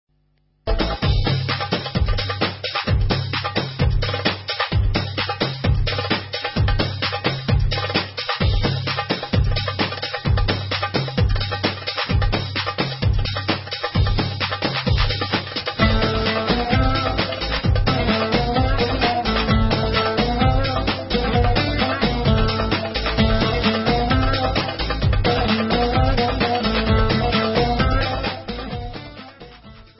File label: Instrumental